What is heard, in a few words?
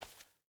footstep burlap concrete walk; Rust